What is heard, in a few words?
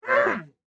panda cant breed; Minecraft